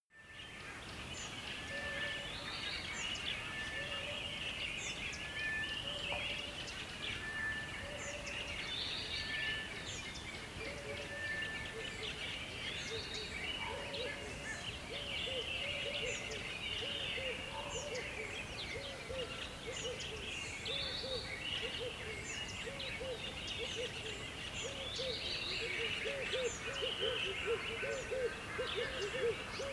river dawn calm

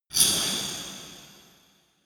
dark resonator hit single hard

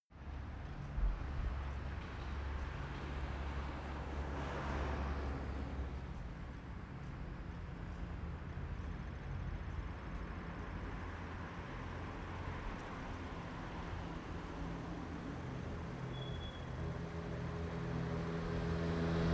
bridge traffic close

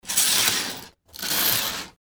curtains closing

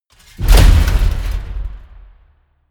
metal crash medium

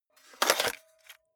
cassette out of cassette player

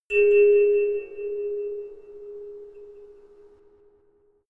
melodic odd tine bell high

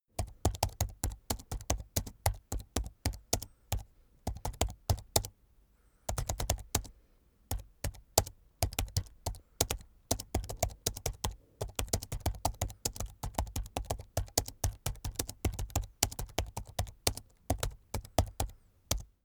keyboard laptop type sequence slow hard

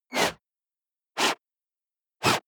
scratch

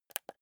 console controller joystick press

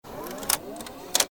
electric door

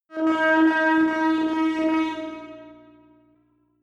synth bass smooth short

